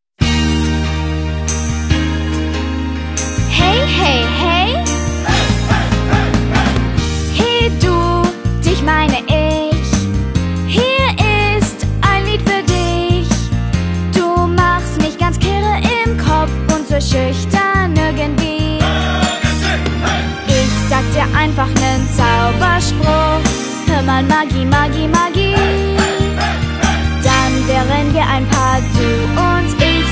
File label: Fan Club Song German